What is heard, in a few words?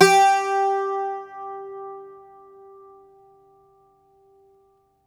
IRISH, Old World Instruments